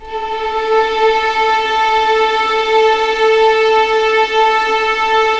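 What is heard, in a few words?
A4 legato mf violins L, East West The Ultimate String Collection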